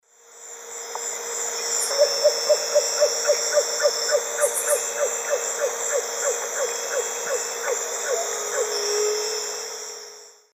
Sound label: Asio clamator, song or call; S. America; Eastern Lowlands